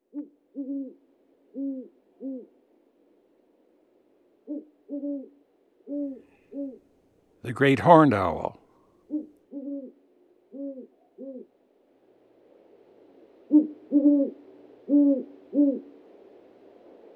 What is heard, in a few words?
Great Horned Owl; Eastern North America; Dan Gibson; Wildlife Identification By Sound